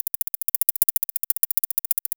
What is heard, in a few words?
Fill, Drums, Eclectic Electronica